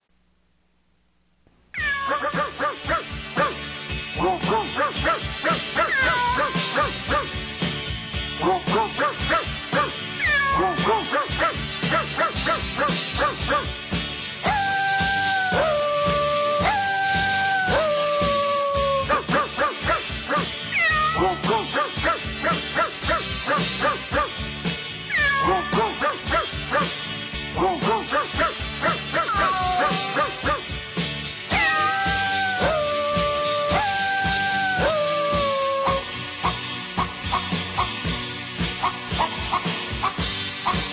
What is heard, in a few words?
bark